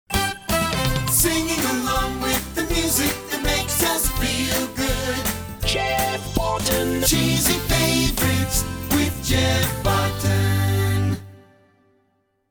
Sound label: Jinglebells